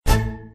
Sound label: wild stop fast; Criss Cross 81; wazdan